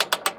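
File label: mechanical Gear Little LOOP; Puddle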